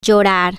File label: cry